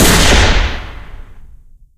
sniper; bazaar bargain; tf

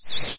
science restore up, windows 9x sound schemes

